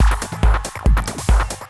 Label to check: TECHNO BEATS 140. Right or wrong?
Right